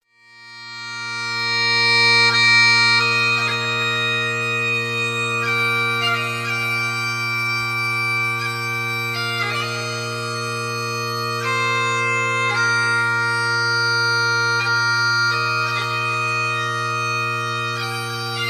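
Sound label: Amazing Grace, bagpipes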